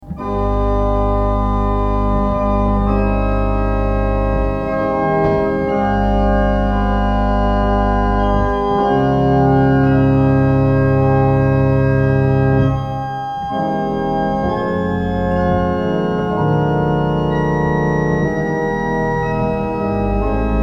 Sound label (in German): Orgel Improvisation